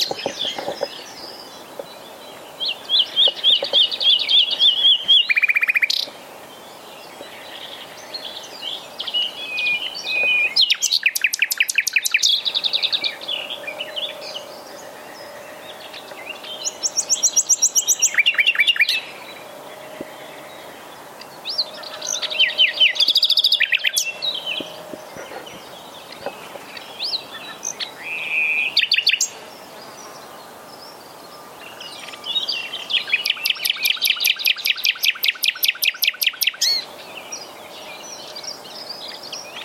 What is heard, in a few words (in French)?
rossignol